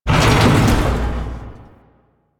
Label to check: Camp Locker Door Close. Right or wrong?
Right